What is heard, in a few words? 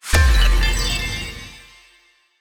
Hero Death FX - F6(9), Video Game; Cymatics; Video Game Sample Pack Vol 1